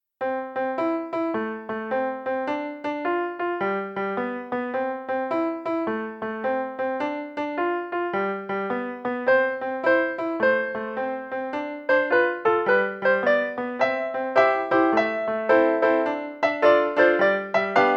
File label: iPad mini Piano